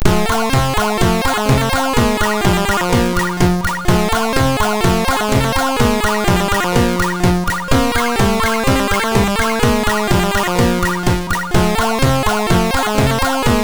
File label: sid MOS6581R2; Fiendish Freddys Big Top O Fun